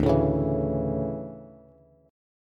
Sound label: D Major 7th Suspended 2nd, Bass; strummed; JGuitar